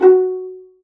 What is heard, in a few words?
Noise F#; Synthetic's Serum Collection; StiickzZ - Sticky Future Bass Vol. 2